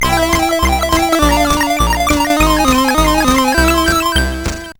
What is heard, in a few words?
Paddle Mania, CSG8580R5